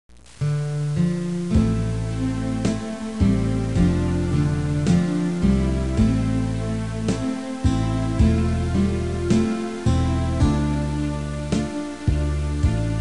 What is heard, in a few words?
alto